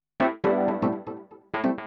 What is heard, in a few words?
ORGAN HOUSE 125 A; eJay; Keys